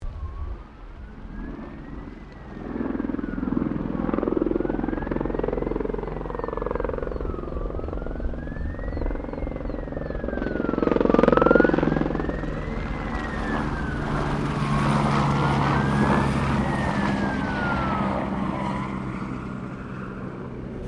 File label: helicopter Bell 204 firefighter bombing run medium; Helicopters; Aircraft Collection